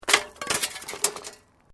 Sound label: Metal Light Movement Minor